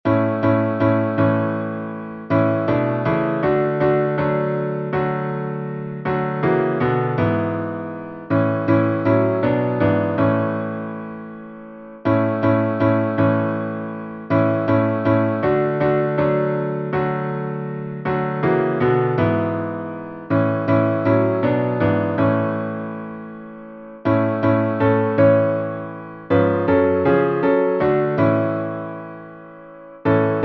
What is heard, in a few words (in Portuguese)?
salmo instrumental